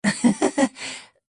laugh short; Adachi

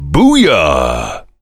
heavy laugh evil; tf; duke

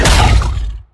attack pounding; attack of the radioactive thing

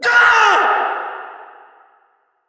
scream

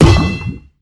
summer jump; tf; pets

